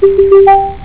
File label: tones; RingTones